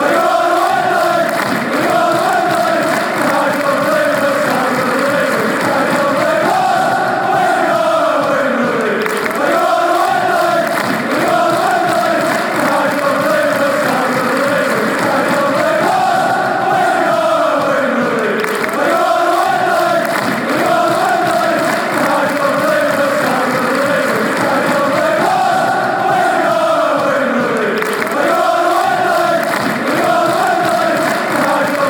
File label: were gonna win league; lufc